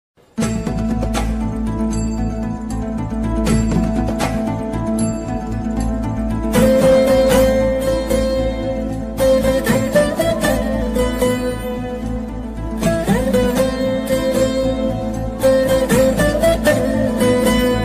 movie bgm ringtone; anegan

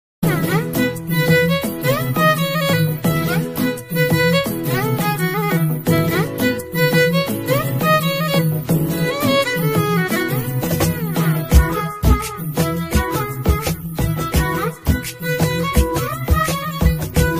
bgm, ringtone, movie music; chor bazaar

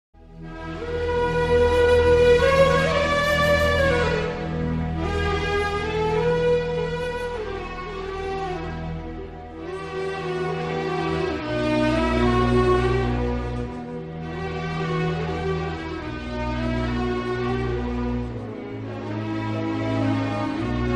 sad bgm; aadavari matalaku ardhale verule

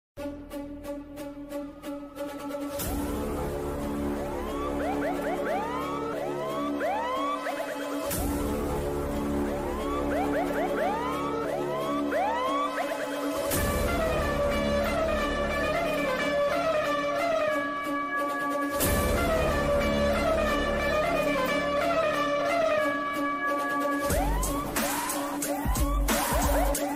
krack bgm

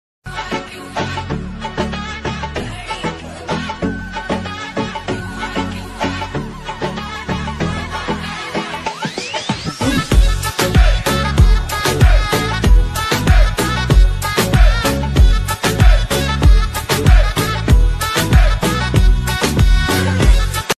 english dj remix ringtone

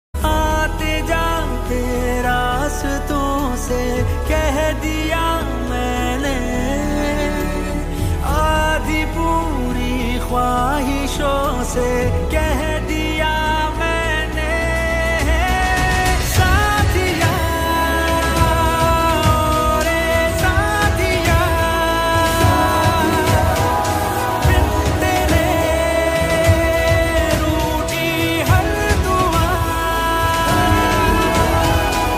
sad song ringtone

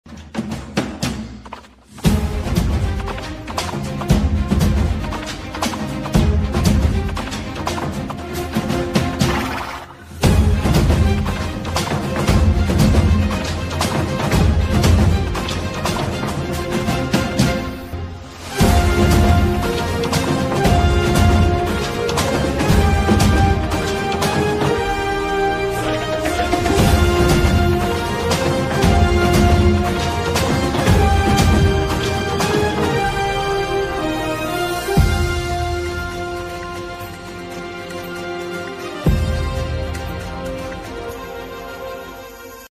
song bgm ringtone; indian 2 movie

